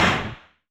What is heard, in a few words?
Aircrafts FW-190D9 Gear Down End; DCS